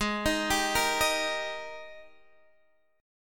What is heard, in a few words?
G sharp Major 7th Suspended 2nd, Guitar, Standard; arpeggiated; chord; x,11,13,12,11,11